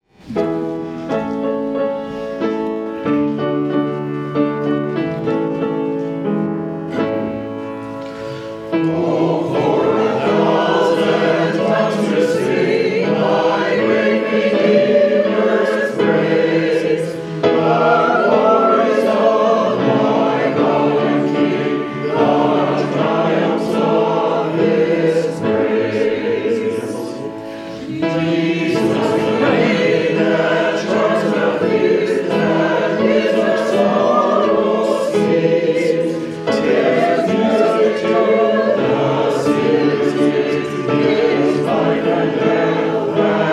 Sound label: hymn; O For a Thousand Tongues to Sing